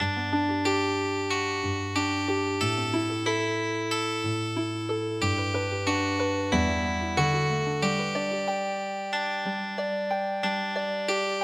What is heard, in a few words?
Healing Harp